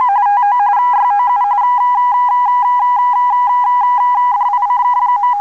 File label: BLEEPS